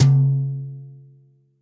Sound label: RACKTOM JAZZ brush center; RACKTOM SR 1209 JAZZ brush center; Ableton; Factory Packs; Drum Booth